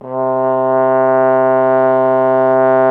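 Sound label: brass trombone C; Miroslav Vitous; Solo Instruments VOL-1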